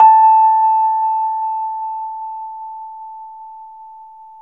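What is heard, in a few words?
Rhodes, A4, right; 3D Sound; 3D Keys VOL-1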